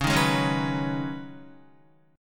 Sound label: Db 7th Suspended 2nd Suspended 4th Db Guitar; 8,6,8,6,6,7; Guitar-4F,4A,5C,5G,5C,6E; Db7sus2sus4 strummed